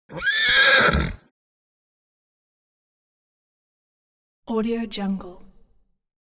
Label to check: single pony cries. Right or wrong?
Right